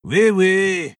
move pain

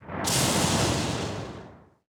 effect dime thunder; feheroes

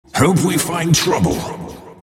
move pain; Monkey King; crown

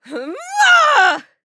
Mage Grunt Physical; Realm Royale; Radon Flux

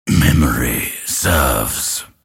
move pain; Dota 2; grimstroke

